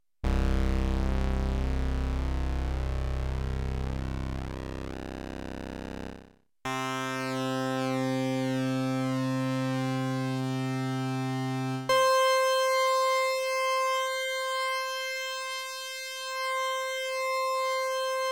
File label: rect width pwm, Kawai sx240